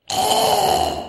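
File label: caaaaaw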